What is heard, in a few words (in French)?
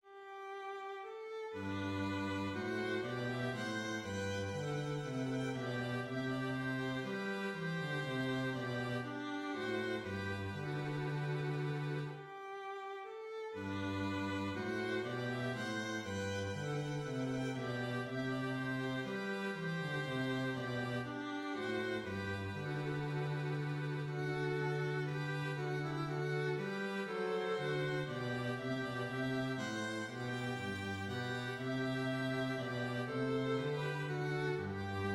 menuet